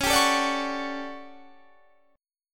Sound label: A 13th C sharp, guitar chord